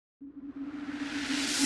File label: 125 G FX Rev